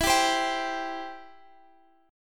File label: B sharp Major, E; B#/E